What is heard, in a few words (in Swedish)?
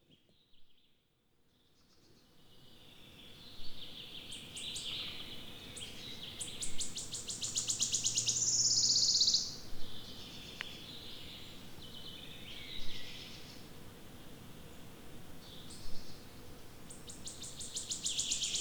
grönsångare; fågelljud